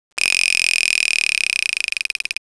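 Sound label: Cricket, Alarm watch; silver; toy; Ararm watch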